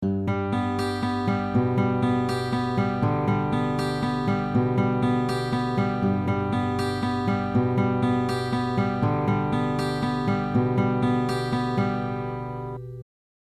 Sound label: 6 8 time